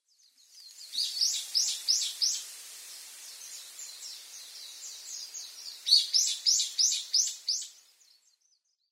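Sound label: Ryukyu Brown-eared Bulbul; kunigami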